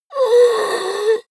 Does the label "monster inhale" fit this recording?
Yes